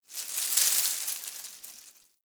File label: Derelict Tree Shake, Interior; Warframe; Orokin Derelict; Ambience